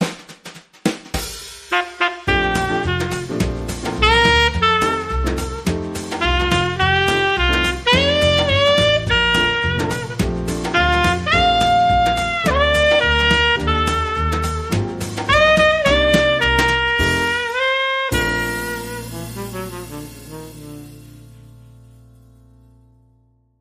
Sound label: Saxophone Swing Happy Birthday